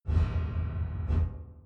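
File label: Sustain Pianoteq Fast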